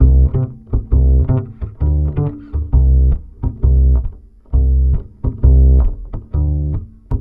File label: BASS, 132, Balkanish Traditions; USB Soundscan vol.63; AKAI